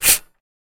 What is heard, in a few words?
Typewriter Paper, typewriters